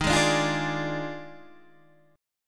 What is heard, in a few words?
Eb Major 7th Suspended 2nd Suspended 4th Eb Guitar Open D